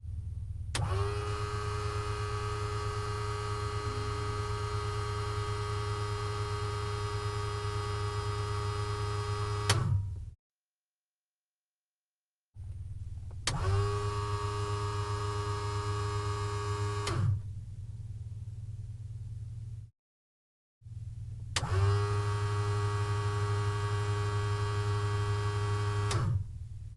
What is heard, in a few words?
cessna 172 fuel pump; ortf mkh8040; Sonniss GDC